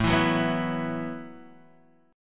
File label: B Suspended 4th A, guitar; Bsus4/A strummed; JGuitar